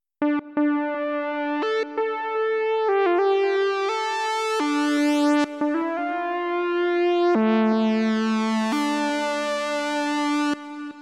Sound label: vocoder synth; XILS-Lab; Vocoder 5000